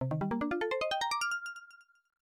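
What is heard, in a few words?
Evolution Fanfare; 46 Okunen Monogatari The Shinka Ron; PC-9801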